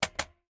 Radio Button Click; MiSide

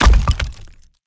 Crack; MiSide

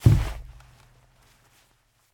Body Wall Hit; Game rip; MiSide

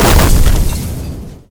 Car Explode; MiSide

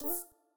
Dialogue Next; Game rip; MiSide

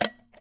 Teapot Close; MiSide

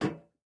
Step Iron; MiSide

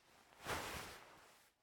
Kick; Player; MiSide

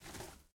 Glue Take; Game rip; MiSide

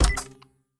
Button Clap; Game rip; MiSide

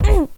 Damage; MiSide

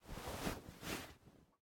Repose; raw game audio files; Mita; MiSide

